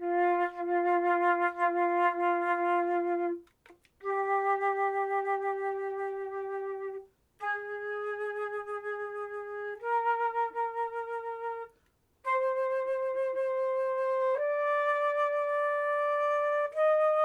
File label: flute